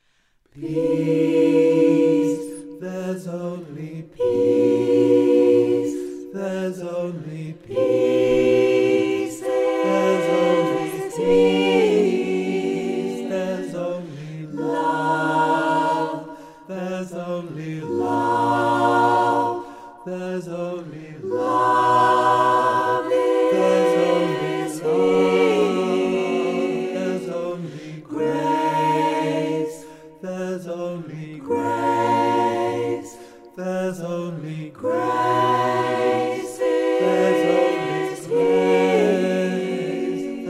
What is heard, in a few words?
Ensemble